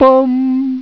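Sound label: Voice High; Instrmnt; Sound Library 2000; Wayzata Technology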